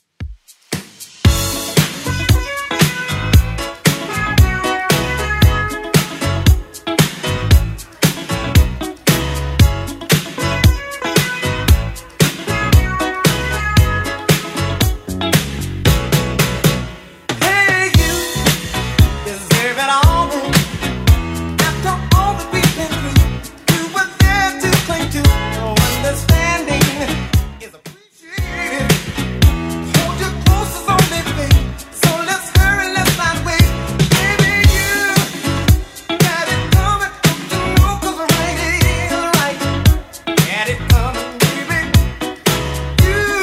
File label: 70s Redrum 115bpm